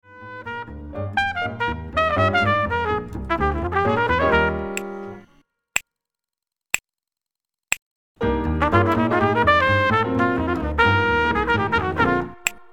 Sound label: minus drums, snaps